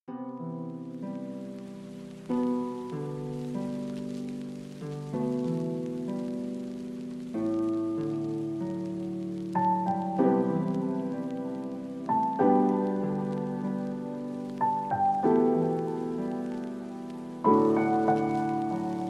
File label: instrumental lofi